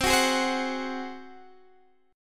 Ab 7th C

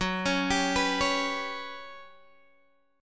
G Major 7th Flat 5th Gb

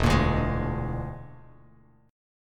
E 7th Flat 5th, down, Bass Standard

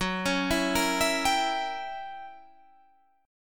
Gb 7th Flat 9th Gb down Guitar Standard; arpeggiated; JGuitar

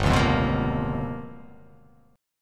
G 7th Sharp 9th Flat 5th

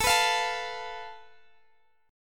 A Suspended 2nd Sharp 5th

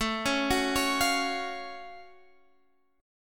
F sharp Minor Major 7th A

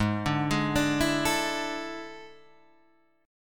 A Major 7th Suspended 4th G sharp

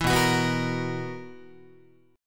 B Major 7th Suspended 4th B, Guitar; BM7sus4; strummed

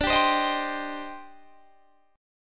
C Minor D; Cm/D strummed; JGuitar

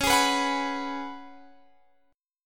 Db 7th Suspended 4th Db down Guitar Standard; strummed; chord shape; JGuitar

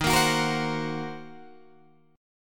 D sharp Minor 6th D sharp down Guitar Drop D

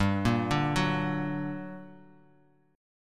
G Minor Major 7th, Bass Standard; GmM7 arpeggiated; 15,13,12,11; JGuitar

